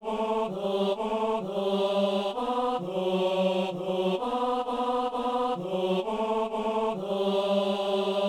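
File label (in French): ténor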